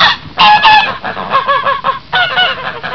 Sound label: Geese